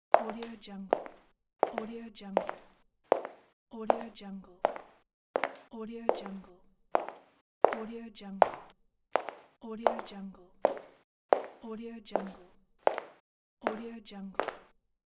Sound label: footsteps